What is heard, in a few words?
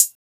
HiHats